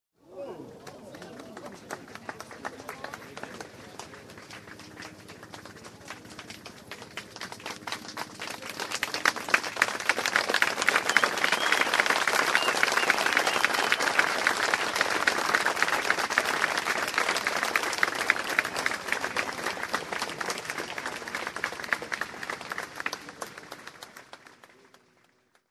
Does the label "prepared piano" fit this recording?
No